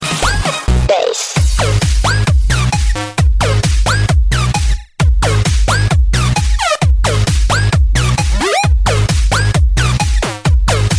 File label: bass